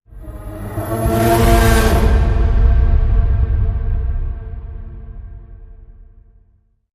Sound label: Scream Whoosh